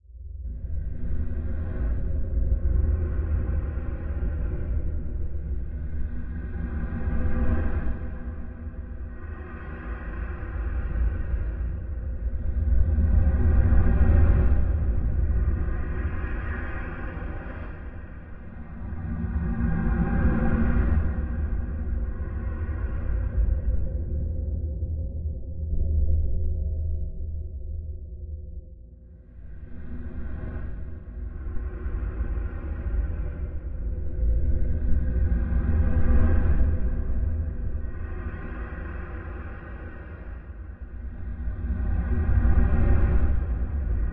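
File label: Ambient Low Howling Loop; Silent Hill Homecoming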